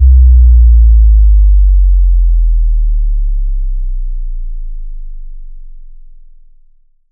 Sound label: Riddim Downlifter, Sub Drop; One Shots; Ghosthack; Riddim Essentials 2020